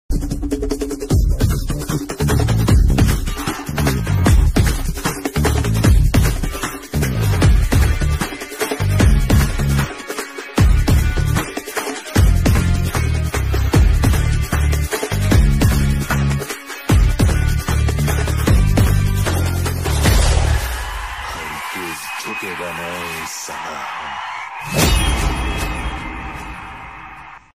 ringtone; pushpa 2

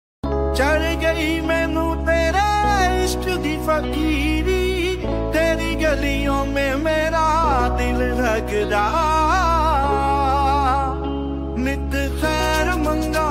punjabi ringtone

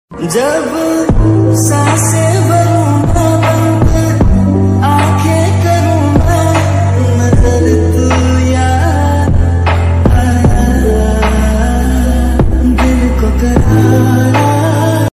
ringtone love romantic ringtone

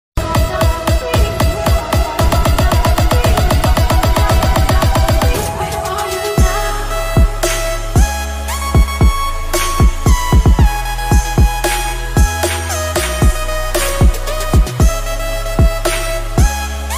crazy mad music ringtone intro music; ap collection

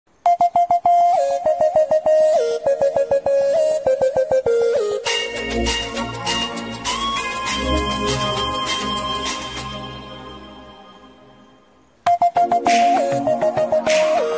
ringtone bgm

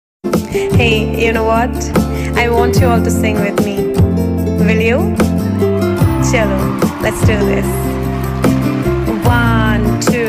navratri instrumental ringtone, bhakti ringtone

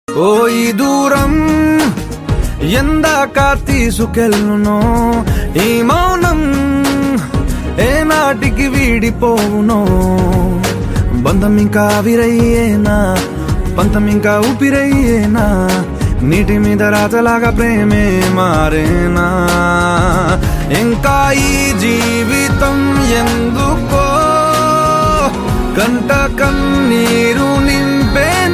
telugu love songs; manam

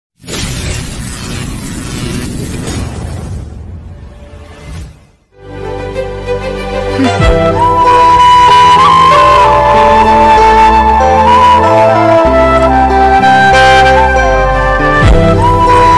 climax bgm ringtone, love bgm ringtone; Premalu

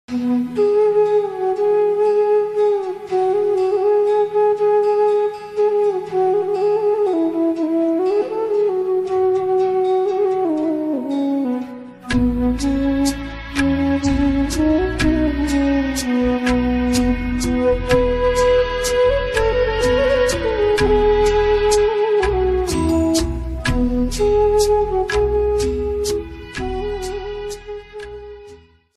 instrumental old ringtone hindi song ringtone

